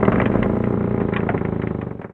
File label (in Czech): vrz; Mafie 1